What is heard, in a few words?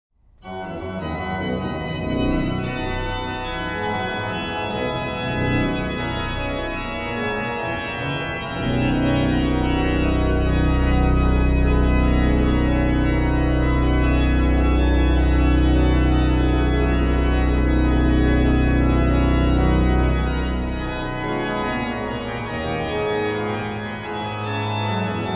Prelude and Fugue in C Major, Praeludium, Bach Organ Works; BWV 545; Preludes and Fugues